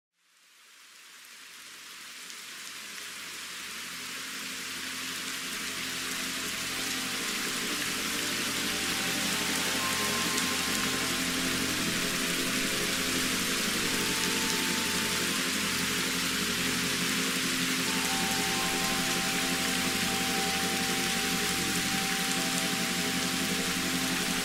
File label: Low Alpha Meditation Brainwave Entrainment with Isochronic Tones